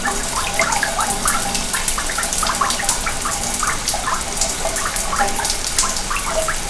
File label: water grate